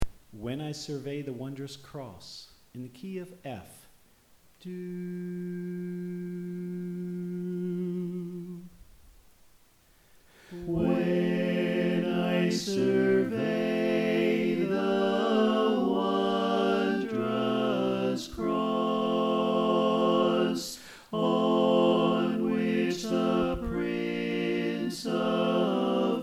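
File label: Tenor Left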